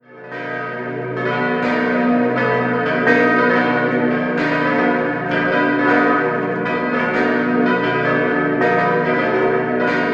Fließ, Glocken

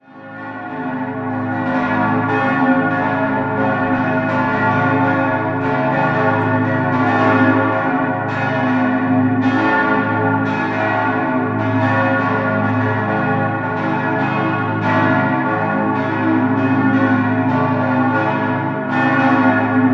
Augsburg, Glocken, Pfarrkirche; Augsburg-Lechhausen; St. Elisabeth; Der Stadtteil Lechhausen liegt im Nordosten Augsburgs

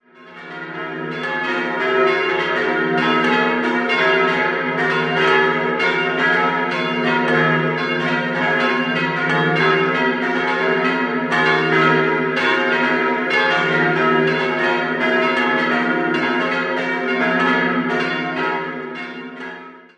glocken, Pfarrkirche; Mariä Himmelfahrt; 1724; 1697; Regensburg; Perkam; Glocke 3 (Sedlpaur, Straubing) stammt aus dem Jahr 1724 und Glocke 4 von 1697 (Schelchshorn, Regensburg)

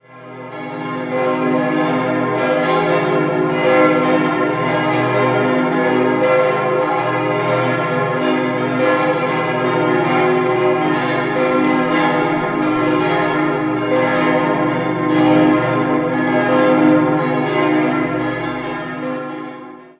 Wiesendangen, glocken

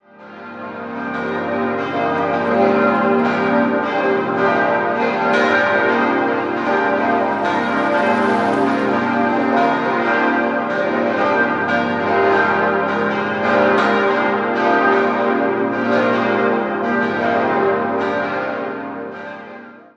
Muenchen Paul, Glocken

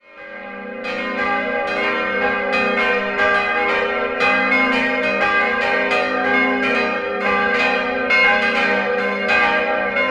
Beucherling, glocken

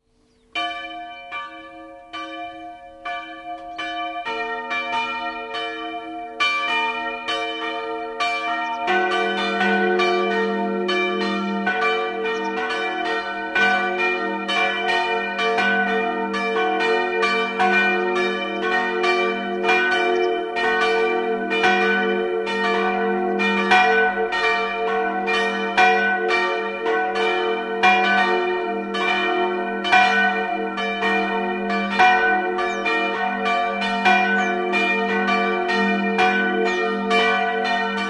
Staufersbuch, glocken

